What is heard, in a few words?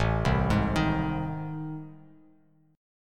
A Minor Major 7th Flat 5th, Bass Standard; arpeggiated; AmM7b5; JGuitar